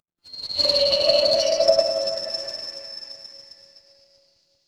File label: magic scifi portal